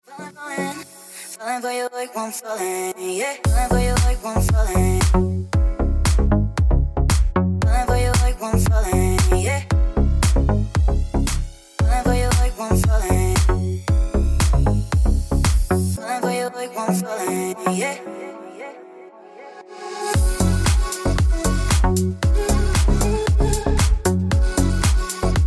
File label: Ringtone